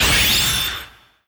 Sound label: Team Skill Full Max